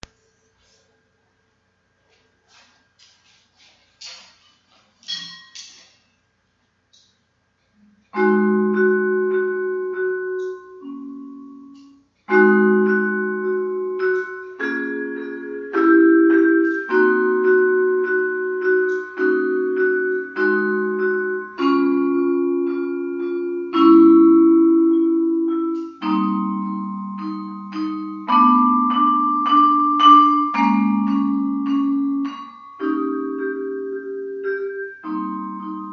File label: chi chi cords, vibes